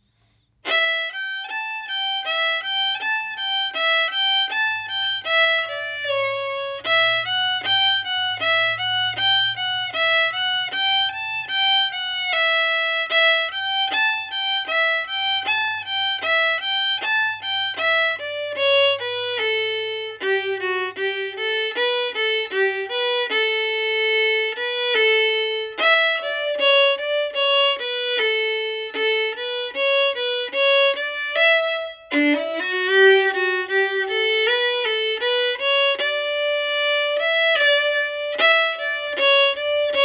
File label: june apple slow, fiddle